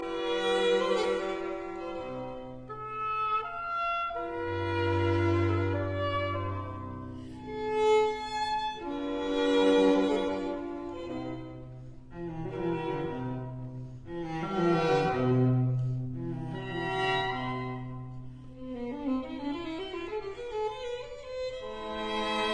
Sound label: arr for oboe and string trio Andante; Piano Trio No 1 in G major; K 496